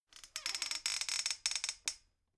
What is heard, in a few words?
folding chair creak